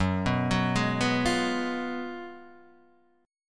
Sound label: F sharp Major 7th Suspended 2nd Suspended 4th, Guitar, Open D